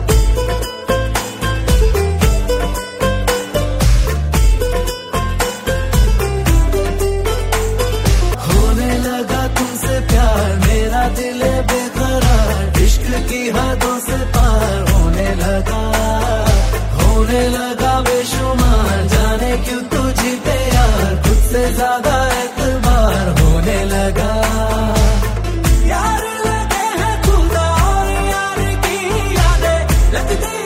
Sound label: Instrumental Ringtone